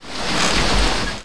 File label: Water Mill; Playable Tutorial Level; TR3